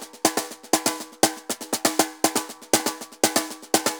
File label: TAMBOR, MERENGUE; Best Service ProSamples vol.14; AKAI